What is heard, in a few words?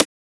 Transient; FLPRO